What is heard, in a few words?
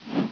eagle flap